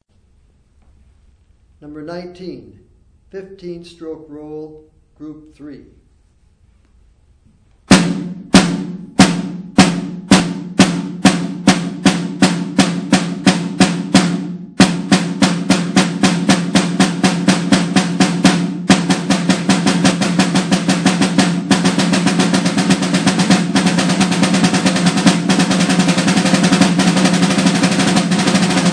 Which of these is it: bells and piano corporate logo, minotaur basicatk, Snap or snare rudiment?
snare rudiment